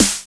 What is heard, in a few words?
SNR, Snares; AMG; Now CD-ROM (Roland); NOW! Drums